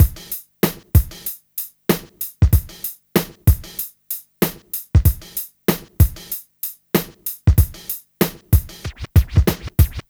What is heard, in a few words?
Street Tip, R&B Drums